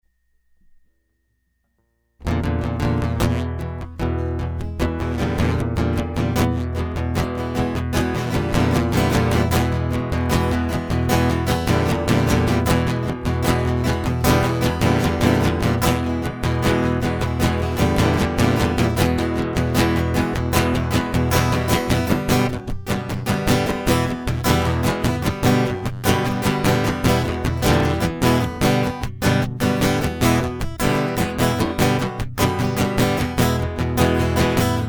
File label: Guitar